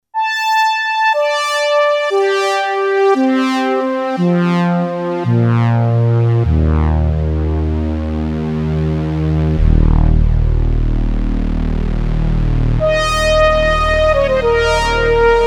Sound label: Vangelead, LEAD; sh-201